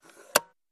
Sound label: Door Inner Shut Slow, dat machine